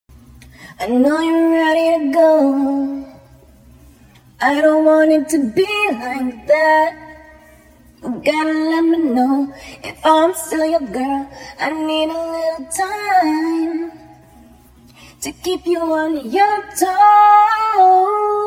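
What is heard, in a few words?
pop 53 C sharp minor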